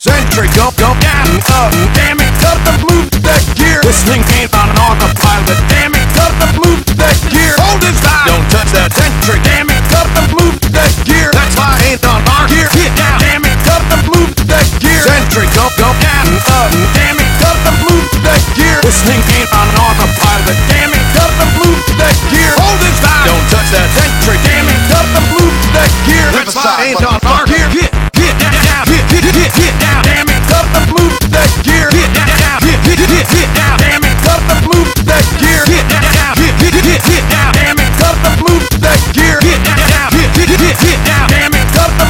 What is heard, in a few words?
Drop, wub wub wub; TF2Maps; chillskies